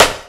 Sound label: Misc Snare; Mo' Phatt; Digital Sound Factory Mo' Phatt